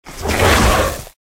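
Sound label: Worm buff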